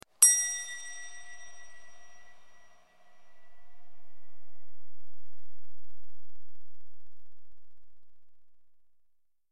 Bell chime single

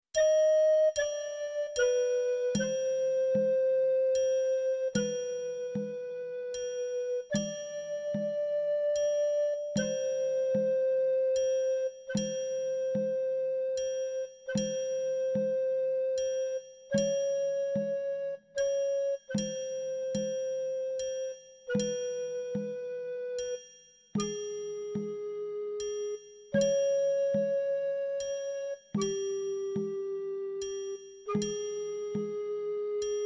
Clarinet accompaniment

